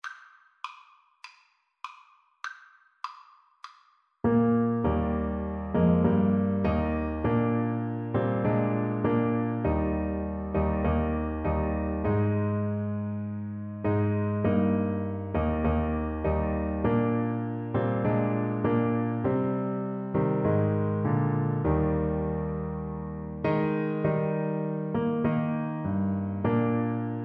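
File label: auld lang syne, alto saxophone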